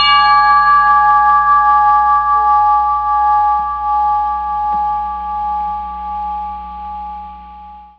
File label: 12in loco